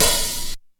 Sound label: damped open hat one shot e key